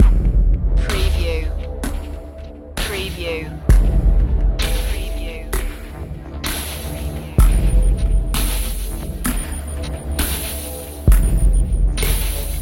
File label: dark afterlife loop